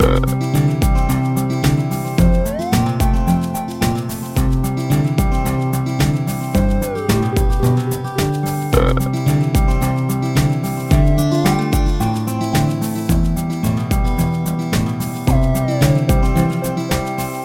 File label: bg Welcome